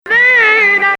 Hijaz Octave

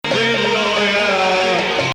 Bayati Ah Ya Hilu Bayati; Bayati 1

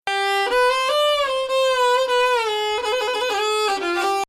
Bayati Hijaz 4, Maqam Bayati

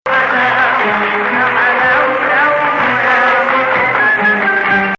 Hijaz

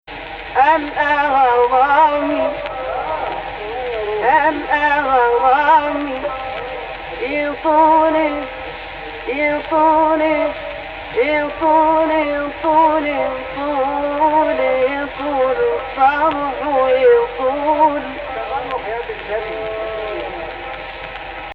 AHH, Egypt Rast; Jins Rast in the upper octave; Actual Key: Rast on C

